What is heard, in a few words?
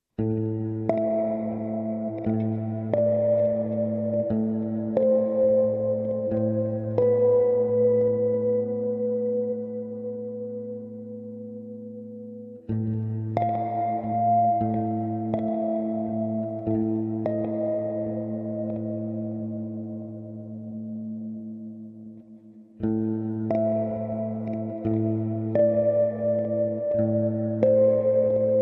Diminution